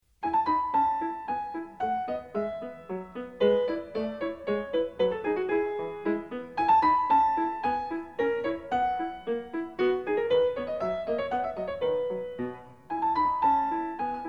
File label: Bach (bwv 817) French Suite 6 - 5 polonaise, PIANISTS; FRENCH SUITES